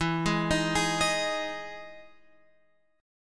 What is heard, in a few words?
E Major 7th E down Guitar Open F; EM7 arpeggiated